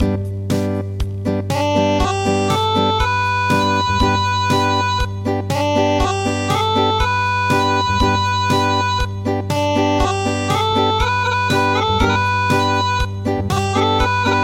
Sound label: Acoustic